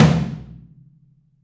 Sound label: Drums Percussion Kit1 Room Mic